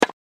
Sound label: incoming